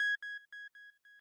sq lead

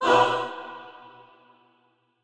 chor

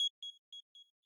arp

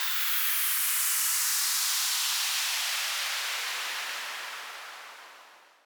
sweep

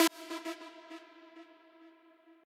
lead

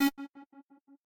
synth

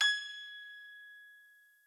piano

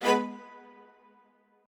strings

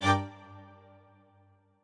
strings

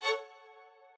strings

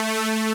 lead

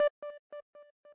arp

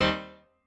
piano

